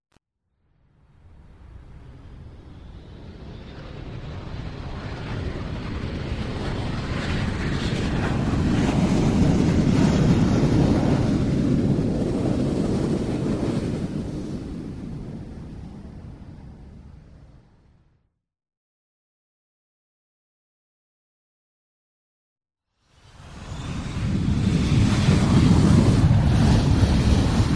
jet flying bye; SOUND EFFECTS1

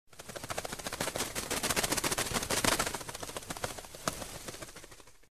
Bird Wing Flaps; Hollywood Edge Foley Sound Library

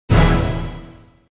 Music stinger - Normal orchestra hit; Hollywood Edge Sound Designers Tool Kit

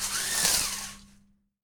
BLIND; 1000fx

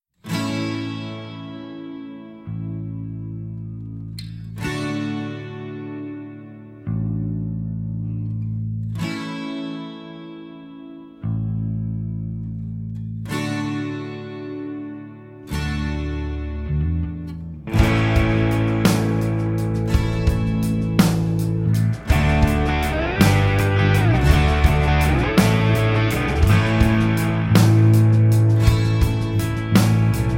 Where the Rain Came Through (instrumental)

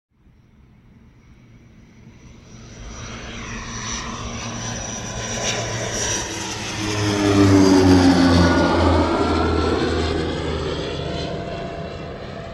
Prop Plane in & By Low Overhead Right to Left; Planes & Trains

